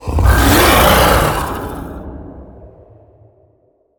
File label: Crabatoa Attack